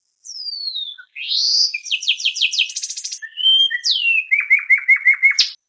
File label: Canary; animals